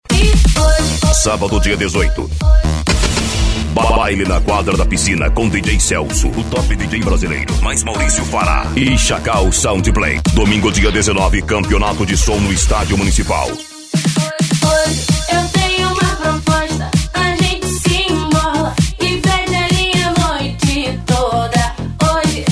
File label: tunning sound car; g4 tuning sound car